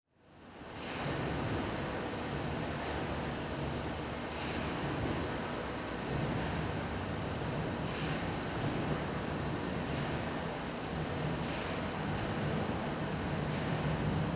Ambience Factory Big Machine; AdobeCC

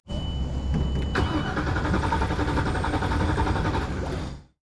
Boat False Start; Transportation

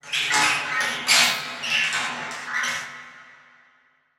Title Transition Horror Dark; Production Element

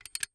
Industry Tool Caulk Gun Creaks Squeeze Trigger

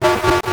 Science Fiction Sci-Fi Electronic Distortion

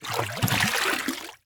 Liquid Water Water Flower Canister Scoop Up Water

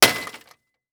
Impact Bat Hit Broken Television Ring; Impacts

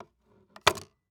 Weapon Pistol Set Down On Cluttered Table; AdobeCC

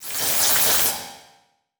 Transition Electrical Warble

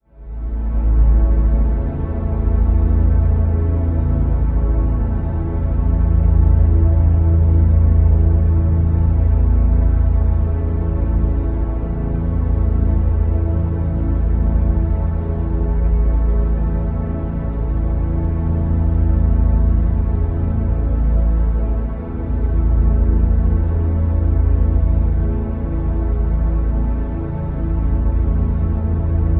Drone Bright Choral Deep Bass Constant; Production Element Imaging; AdobeCC

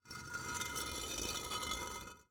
Cinder Block Sand Scrapes; Foley

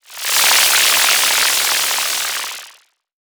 Title Transition Electrical

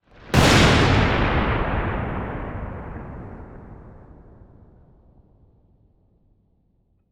Weather Storm Lightning Bolt Crash Crack

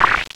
Title Transition Blurp; Production Element Title Transition

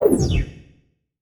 Flash Pop Up; Multimedia Internet CD-Rom Flash

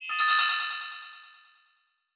Multimedia Internet CD-Rom Flash Beep Multiple

